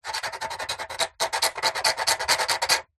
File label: metal scrape sharp